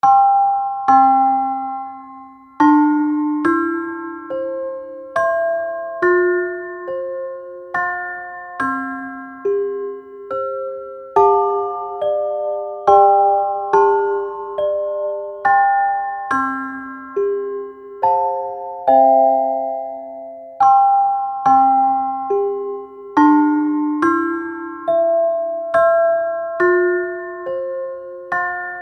Aogeba Totoshi chime

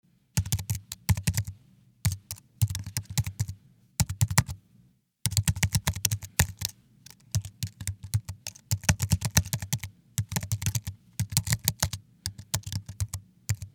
PC Keyboard mac

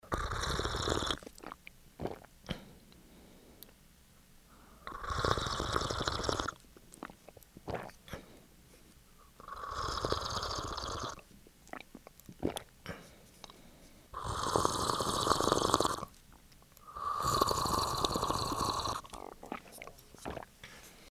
drinking tea

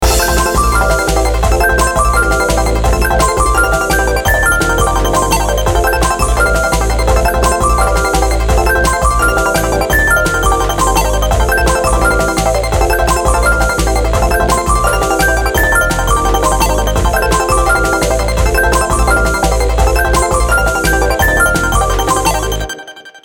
synth texture

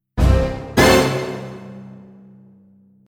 surprise

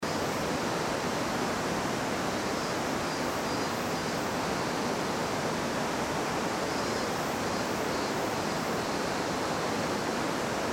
river

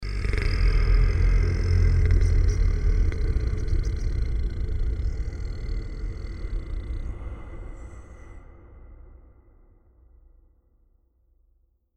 monster growling

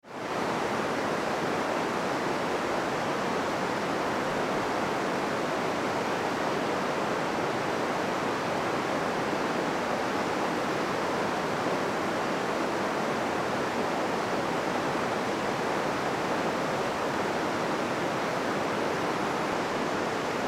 river

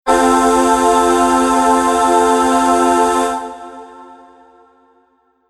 jingle chorus

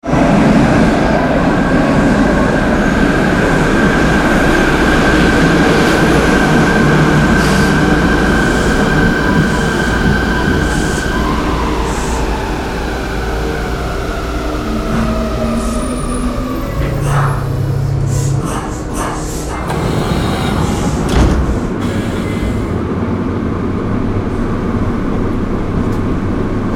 subway

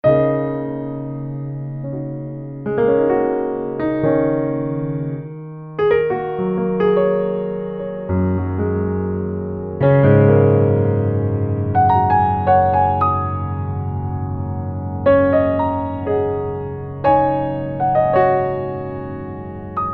piano

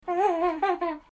baby laughing

